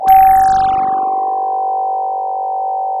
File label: Sound of a phone ringing